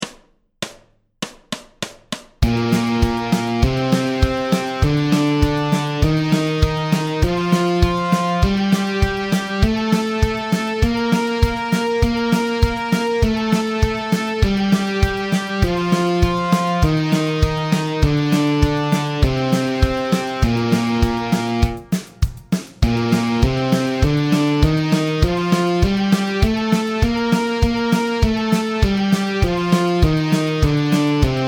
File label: Bb Major Scale Lightning Speed